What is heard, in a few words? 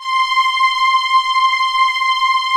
LUSH STRGS, JV PADS; Pro-Rec - Synthsonic